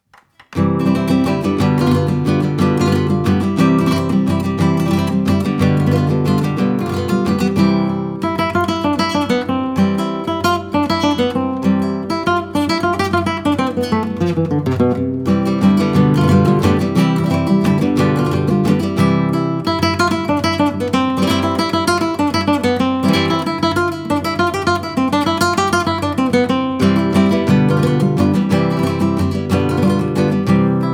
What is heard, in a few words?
zapa solo